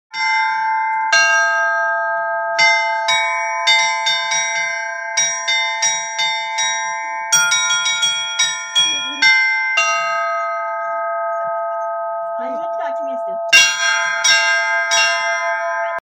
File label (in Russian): Пасхальный колокольный звон